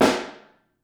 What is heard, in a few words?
Snare Room; Ableton Foundation FreePacks; Snare Designer